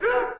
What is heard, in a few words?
dangerous creatures critical stop; windows 9x; sound schemes